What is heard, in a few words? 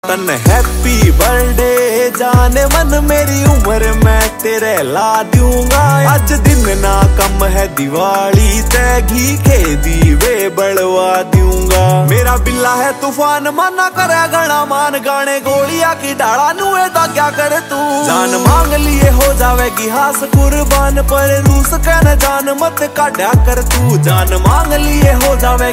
Ringtone